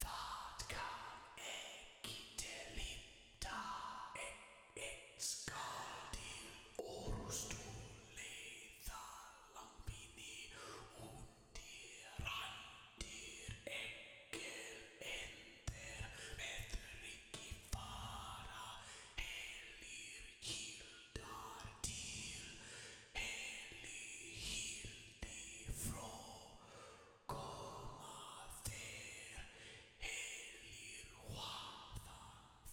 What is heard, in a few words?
Vocals (Compressed); song of iron